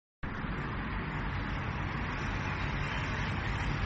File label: noise test traffic birdsong from bedroom